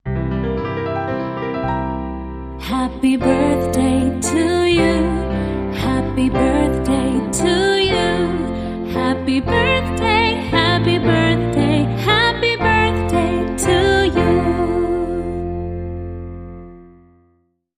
Female Vocal with Piano Traditional Happy Birthday